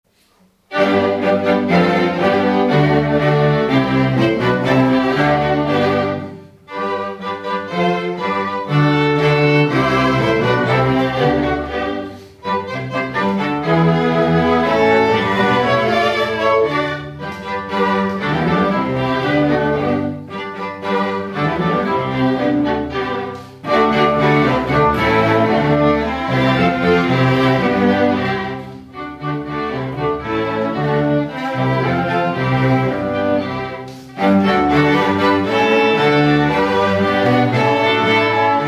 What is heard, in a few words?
String Ensemble; Trasimeno String Ensemble; Matona, my dear - O. di Lasso